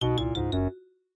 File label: MIDI Animation stings